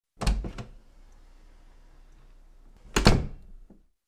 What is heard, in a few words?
Opened and closed the front door to the; Открыли и закрыли входную дверь на улицу; street